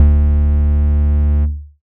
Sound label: Bass Shot D